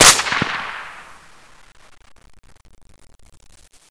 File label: usp, weapons; cstrike; DEX